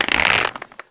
charge start, weapons; sven; cso; failnaught

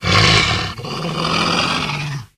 death, pseudodog; russian; sven; russian sounds

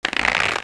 charge shoot, weapons; sven; cso; failnaught

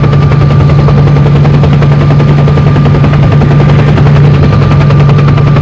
helicopter, hind; russian; sven; russian sounds

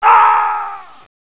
die, player; bts rc; construction

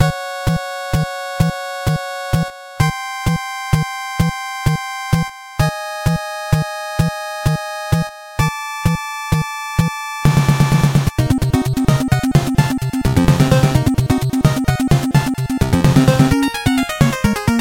boss, bgms; svencoop

